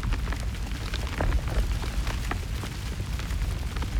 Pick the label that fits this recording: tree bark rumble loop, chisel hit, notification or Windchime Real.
tree bark rumble loop